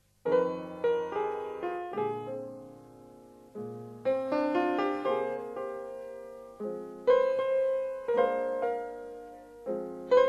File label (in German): Piano Blues und Ragtime